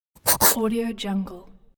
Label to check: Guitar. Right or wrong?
Wrong